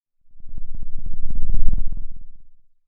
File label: Sound of a lion’s roar